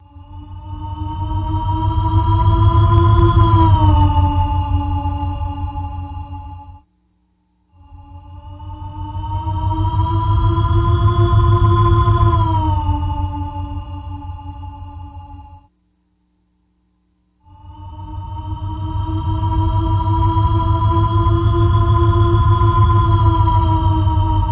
Starship Warp Bys X3

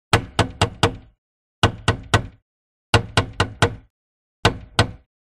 Brass Knocker, knocks

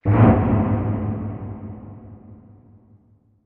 Bass Impact Tone Bel; impacts

